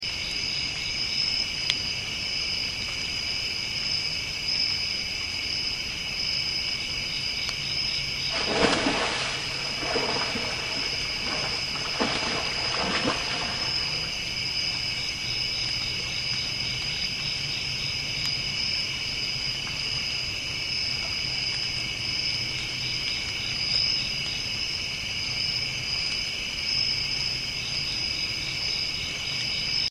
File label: water splashes